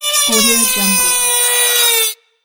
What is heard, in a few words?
metal grinding